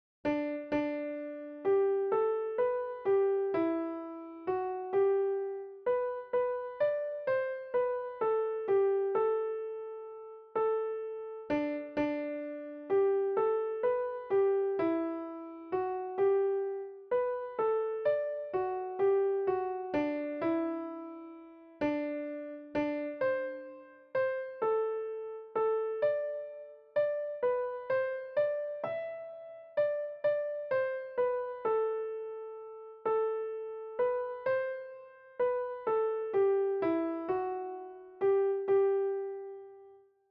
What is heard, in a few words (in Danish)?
sopran; Det dufter lysegront